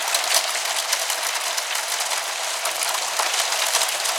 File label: Fire Loop Litt